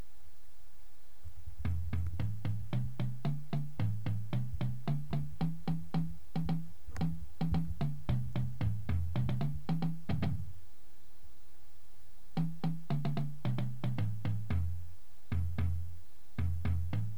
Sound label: bass, drumline